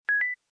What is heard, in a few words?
Minute minder; LG KF900 Prada II